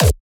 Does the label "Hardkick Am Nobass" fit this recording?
Yes